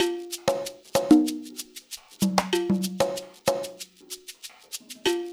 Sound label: LOOP, Percussion